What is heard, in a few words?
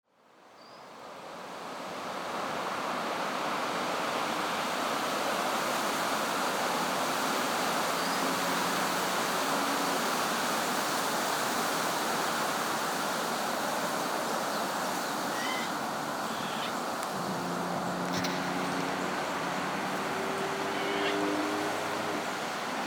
July Forest Wind and Jay